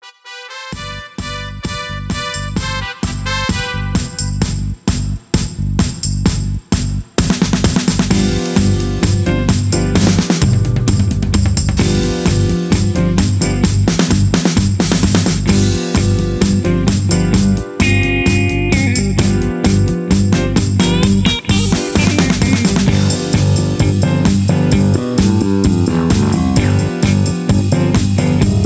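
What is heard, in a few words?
No Leads No background vocals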